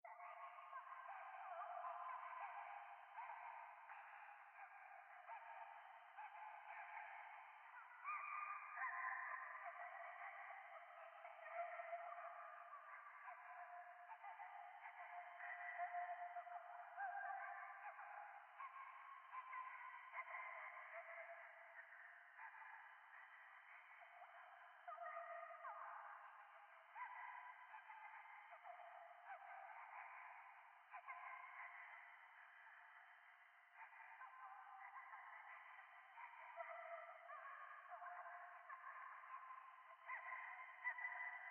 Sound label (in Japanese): ゴースト 可愛い喘ぎ 山奥